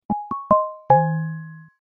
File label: windows notification